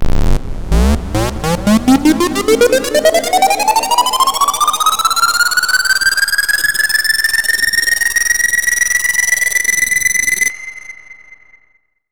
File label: Sweeps Up, Effects